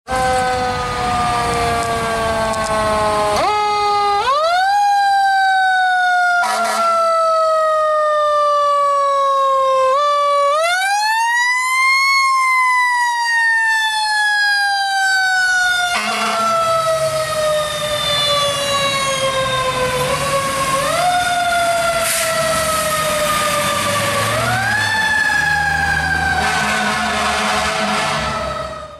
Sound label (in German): Feuerwehr